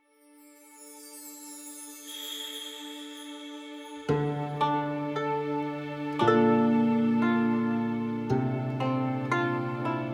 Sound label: Song of Purification - Instrumental; Monster Hunter Rise Original Soundtrack